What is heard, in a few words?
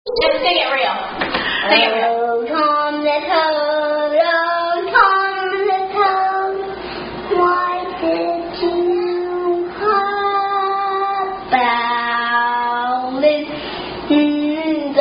Sings; O Tom The Toad